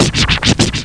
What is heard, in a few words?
Scratchin